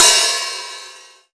dampened open hi hat one shot b key

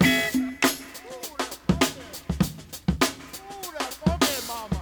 100 bpm modern breakbeat e key

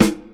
fat snare g sharp key; sample; Duration 0:01; Samplerate 44100 Hz; Channels Mono

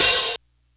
small reverb open hat d sharp key

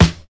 dry snare drum e key, Loudest frequency: 1198Hz

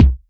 clean bass drum sample c key; tuned drums

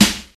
00s piercing acoustic snare sound g sharp key

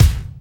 good kick drum sample c key

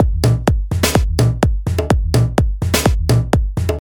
groovy house kick and snare loop; drum loops

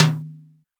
subtle reverb tom single hit d sharp key; tom drum

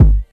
low tone kick sample G sharp key; kick drum samples; one shots

